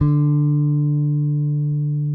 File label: Whole Notes D, Bass; Bass X